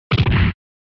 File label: SNES Fighting Hit